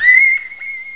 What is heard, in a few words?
BAT, Dieren